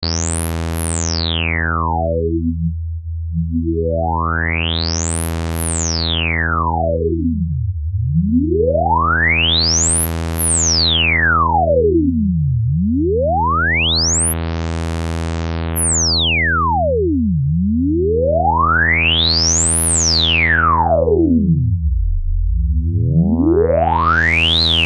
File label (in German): Selbstoszillation